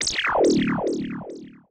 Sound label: SPACE FX 140; SpaceSounds